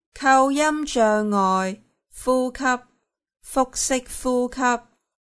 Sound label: exercise breathing; aphasia